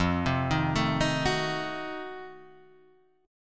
F 7th Flat 9th F down Guitar Standard; F7b9 arpeggiated

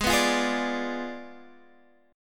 B Diminished 7th Ab

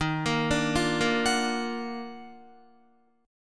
Eb Minor Major 9th Eb down Guitar, chord; EbmM9 arpeggiated

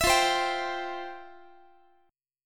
F Major 7th Suspended 2nd F, Guitar; strummed

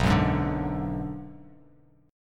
B Minor 6th B; Bm6 strummed; JGuitar

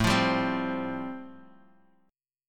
A-Minor 7th Sharp 5th, chord; 17,20,17,17; Am7#5 strummed; JGuitar

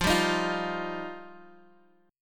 F 7th Suspended 2nd F

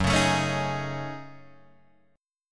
F sharp Minor 6th Add 9th, Guitar, Open D

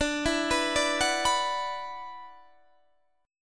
E 7th Suspended 2nd, D, chord

